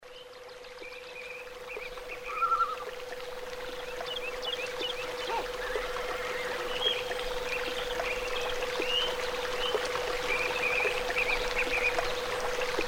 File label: good sleep nature